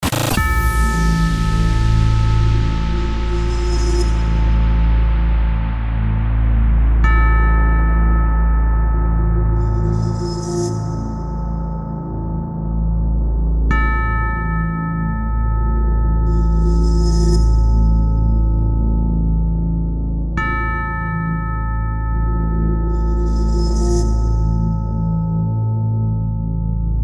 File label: DRONE ITS TIME 144BPM AMAJ